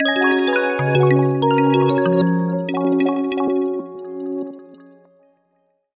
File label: ringtones